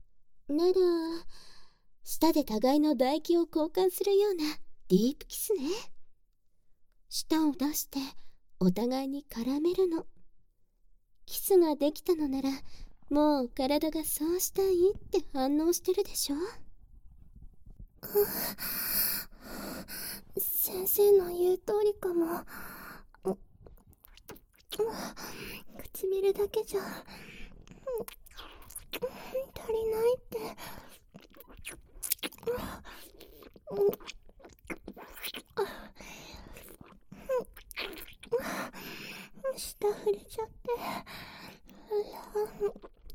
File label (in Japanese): キス